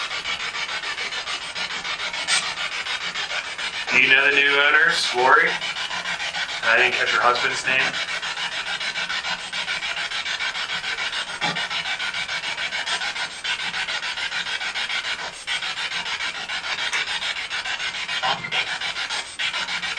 Seance Room Spirit Box; wildwood sanitarium investigation

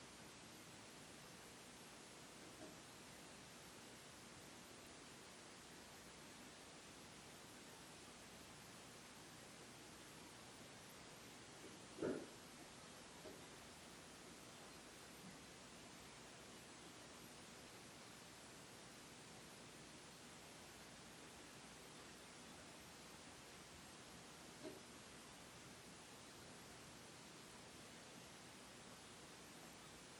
Inside Tattoo Shop

